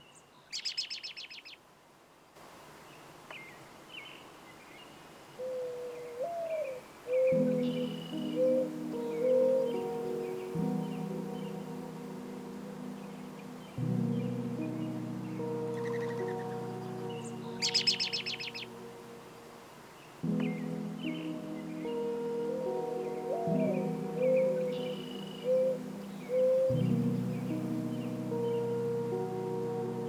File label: School Rooftop Bird Sounds; Hisohkah